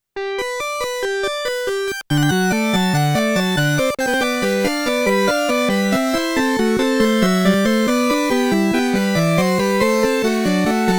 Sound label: harpsichord, synth Roland alpha juno